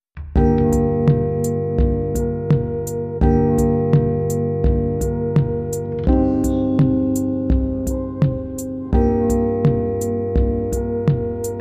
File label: Funeral March, No Violin